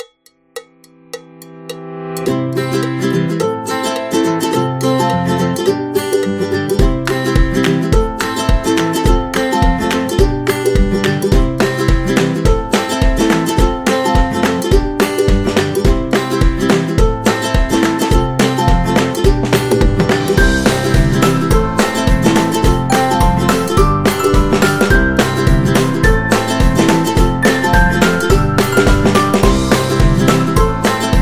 Upbeat Folk; happy music vol 2

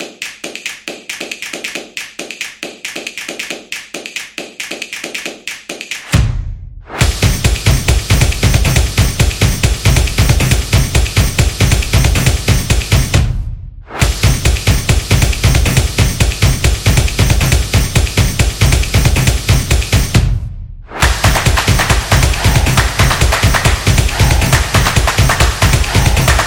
Motion Graphic Background

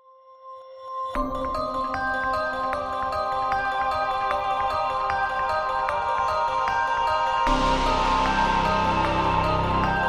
The Trap

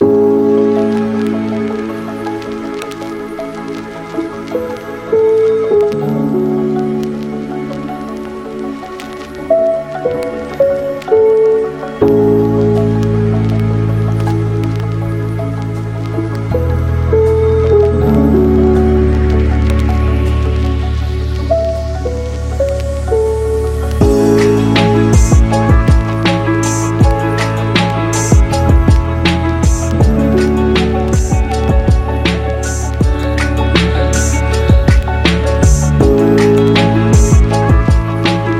Lofi

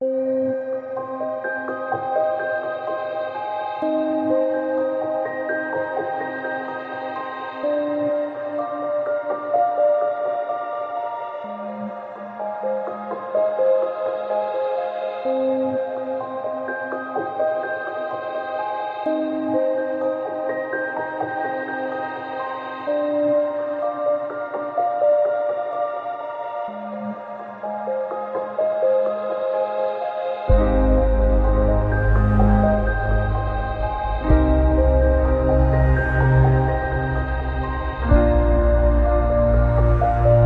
Peaceful

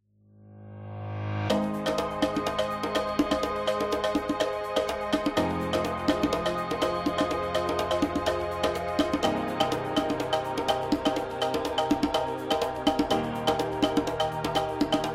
Africa Positive; sounds of the world

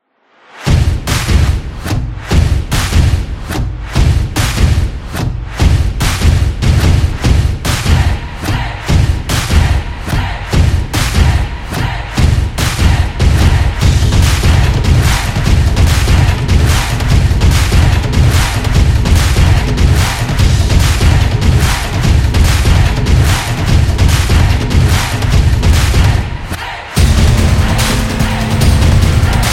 The Sports Percussion; soccer stadium

